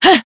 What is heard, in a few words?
dash; Valorant; cstrike; agent; jett